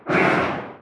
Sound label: unborn growl